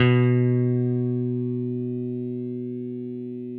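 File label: 6 string bass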